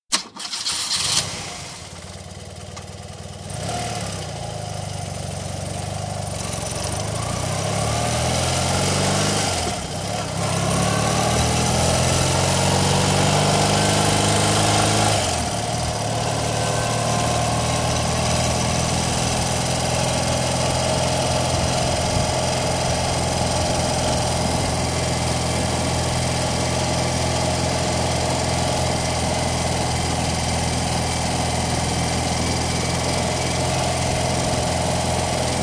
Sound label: BMW Motor Cycle, start up, constant run, stop (Recorded on bike) (980cc, 2 cylinders); 1982 model; BBC